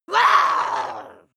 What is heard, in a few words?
witch scream attack